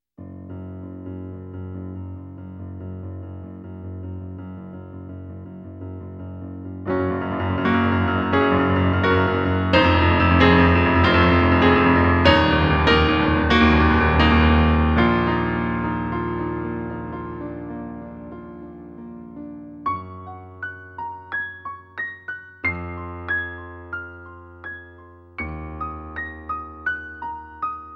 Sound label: item collect, piano